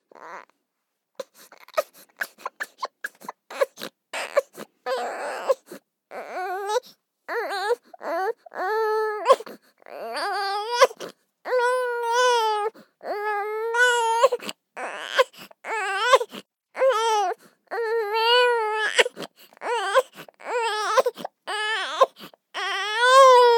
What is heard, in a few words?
BABIES WEEP 3 months Upset Boy; Baby Boom